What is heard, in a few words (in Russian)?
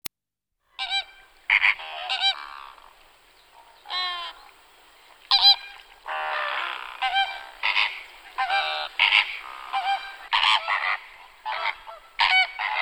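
zvuk rozovogo flamingo, sound of greater flamingo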